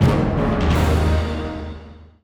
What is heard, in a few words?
Monster Fight Ends; Deck of Ashes